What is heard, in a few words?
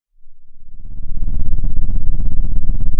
pop, Sound of an engine revving